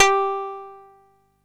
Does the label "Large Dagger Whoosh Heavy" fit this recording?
No